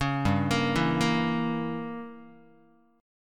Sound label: G Diminished 7th Guitar, Gdim7 chord